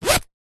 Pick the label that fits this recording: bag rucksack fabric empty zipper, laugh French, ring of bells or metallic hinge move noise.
bag rucksack fabric empty zipper